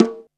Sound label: CONGA HI; Back In Time Records - Big Bang